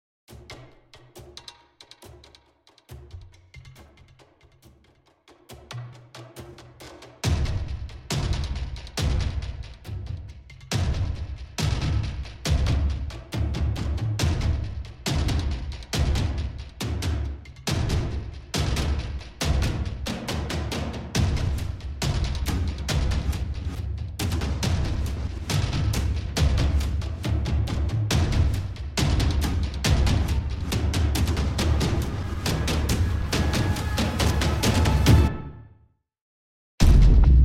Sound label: drums